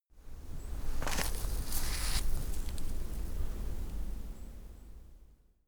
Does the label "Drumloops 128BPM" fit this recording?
No